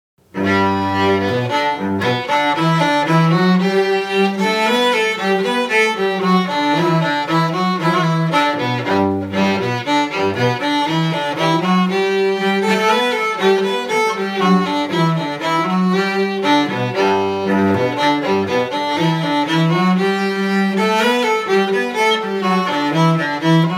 Louis Cyr slow

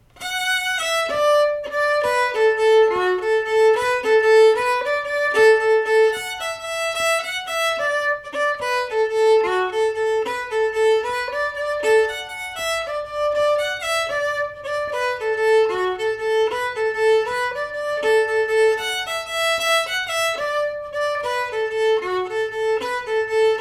Jig slow

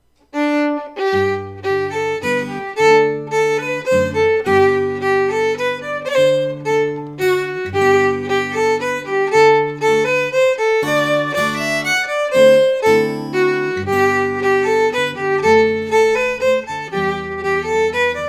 Munster Cloak slow, fiddle

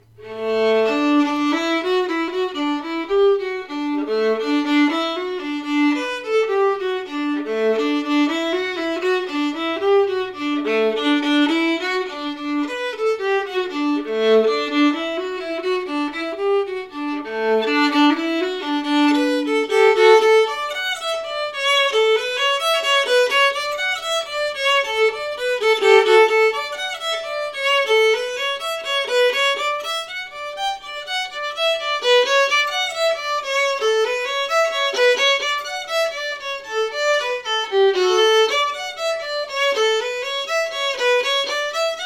La Grande Gigue Simple slow

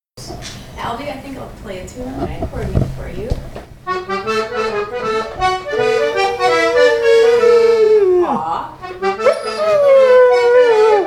singing

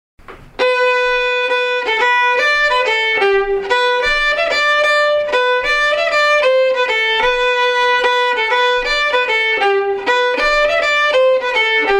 Old Favorite slow

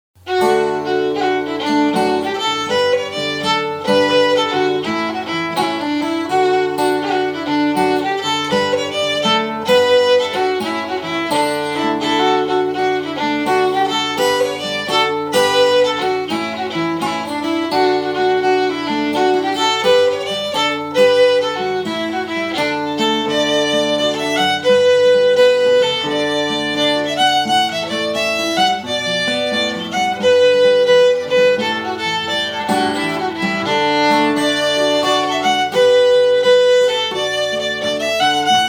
Kerfunken Jig slow

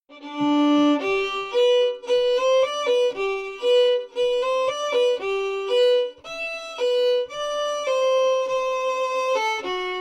Crooked Stovepipe slow

